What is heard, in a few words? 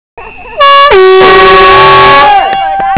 horn